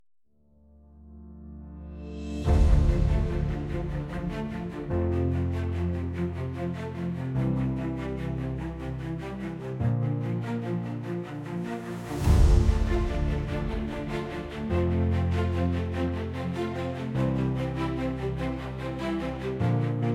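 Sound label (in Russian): victory and sorrow, voennaya muzyka bez slov; voennaya muzyika bez slov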